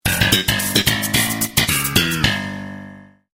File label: Sitcom Bass Riff